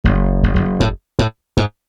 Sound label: slap bass; esoteric